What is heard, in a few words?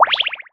Health; Megaplane